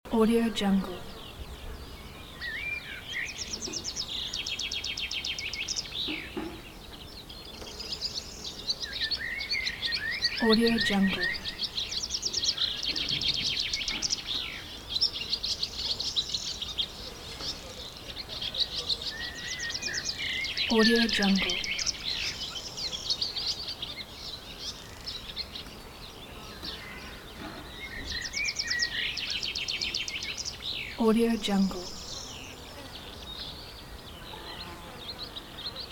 countryside ambience with finches and barn swallows loop